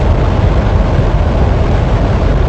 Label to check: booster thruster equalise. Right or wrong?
Right